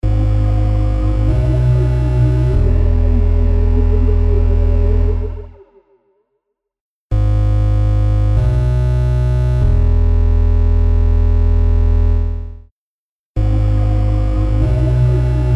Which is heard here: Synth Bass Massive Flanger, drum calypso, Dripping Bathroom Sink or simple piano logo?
Synth Bass Massive Flanger